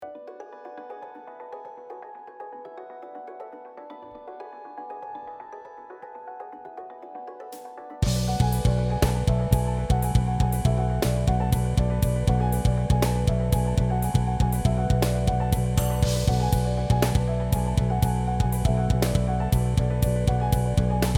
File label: Neck Breck Rhythm Backing; rhythmus uebung; gitarrenunterricht